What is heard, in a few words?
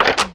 lever toggle; GoldMinerJoe